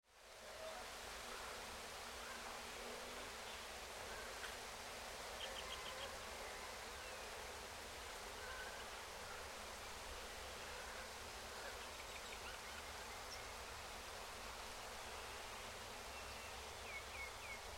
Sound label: distant birdsong, Thailand